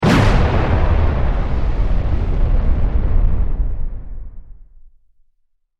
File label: Science Fiction Explosion; Battle; SFX Bible